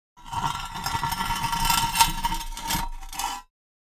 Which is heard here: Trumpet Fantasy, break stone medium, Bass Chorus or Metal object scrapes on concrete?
Metal object scrapes on concrete